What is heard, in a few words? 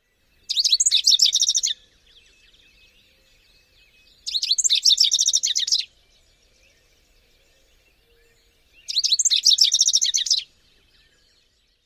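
rufous-crowned sparrow songs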